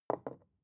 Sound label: glass put down wobble light